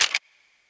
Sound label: Weapon Foley